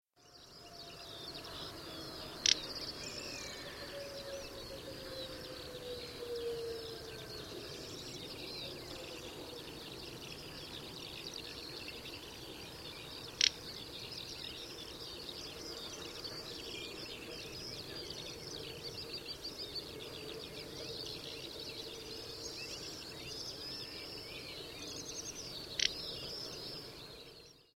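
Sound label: strepet golos, little bustard voice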